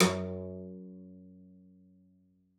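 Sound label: CELLO spiccato F#2; 90 s Sample CDs; Xsample VOL-5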